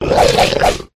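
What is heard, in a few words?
lizard attack middle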